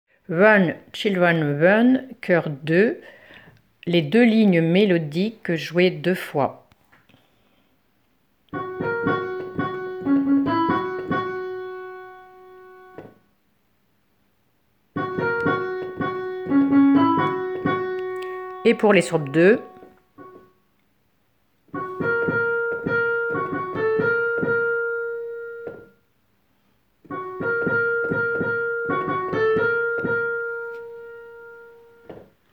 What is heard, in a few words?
Run children run choir